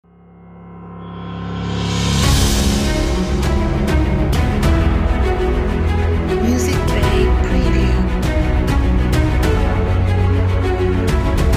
Epic Trailer Music

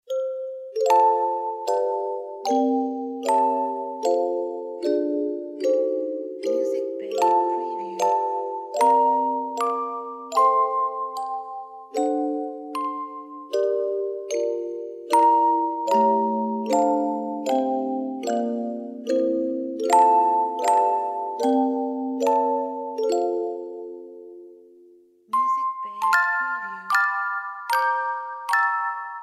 Christmas Music Box

